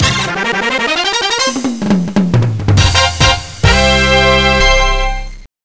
Level Clear; Jazz; Jazz Jackrabbit 2

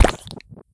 Honey Tile Splat; gamerip; Candy Crush Jelly Saga

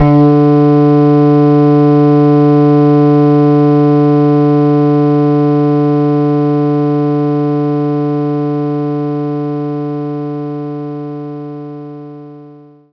epiano D3; Knights Try

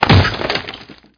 Zombie Break; CastleMiner Z; Xbox 360

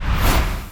ui quest element whoosh

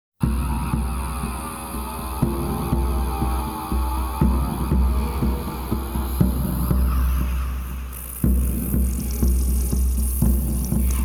America - War - Heavy Gurgling; Civilization V

